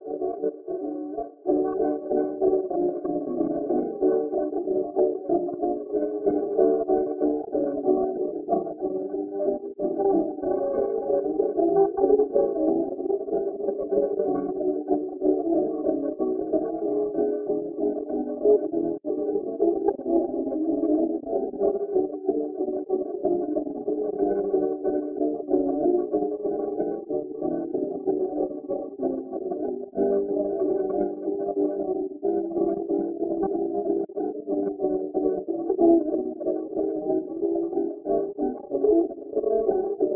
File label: Jazz